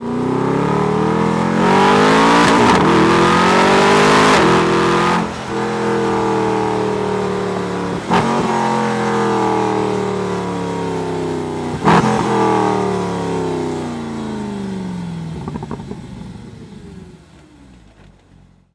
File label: V8 Sound